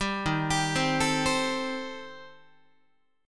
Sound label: D sharp 6th Flat 5th, D sharp, down, Guitar; arpeggiated; JGuitar